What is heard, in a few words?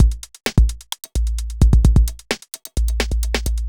fast break 130